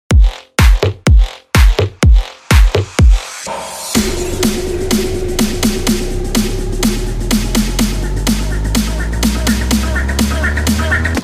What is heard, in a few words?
electro ringtone